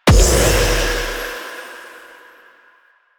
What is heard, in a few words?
Proj Explosion A, Weapons, Sidearm; Warframe; Tenno; Wraith Sidearm; Epitaph Prime